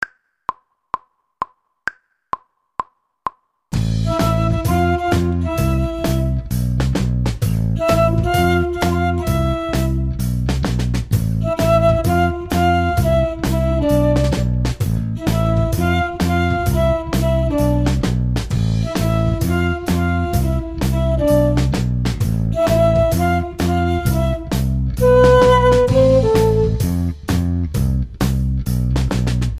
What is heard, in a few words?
flute